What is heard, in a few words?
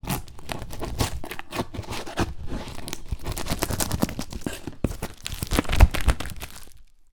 foley package box remove label knife